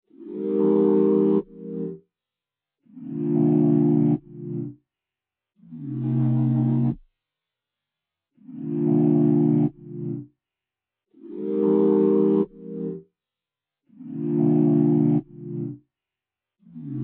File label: 87 BPM C Min Sine Chords; Melody; RnB; Loop Stems; Cymatics; 2022 Melody Collection; RnB Pt 2; Thunder